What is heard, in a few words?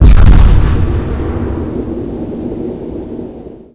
blackhole impact, weapon